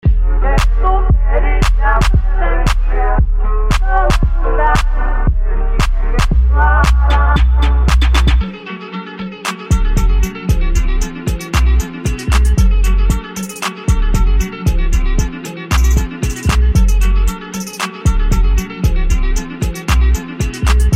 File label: TRAP REGGEATON 115 bpm